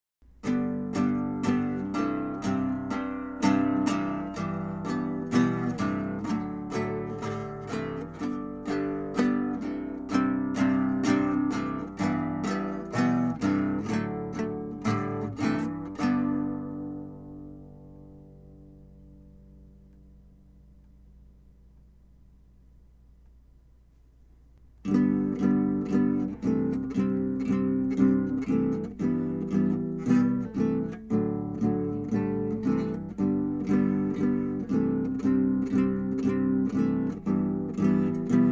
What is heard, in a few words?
fakelele strummed chords, ukulele